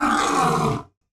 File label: lion cry